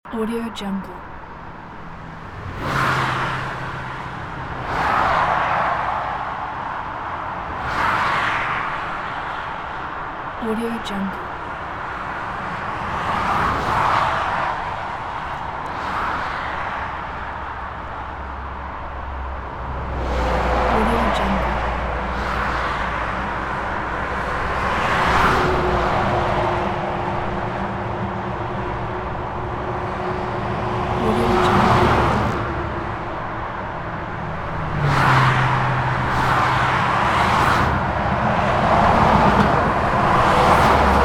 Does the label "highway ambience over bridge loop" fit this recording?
Yes